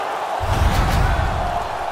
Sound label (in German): erscheinen des logo; national hockey league 2019